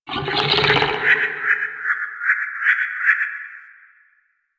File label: Cave Creature, Ambience; One Offs; Creatures; Warframe; Quests; The New War; Drifter Camp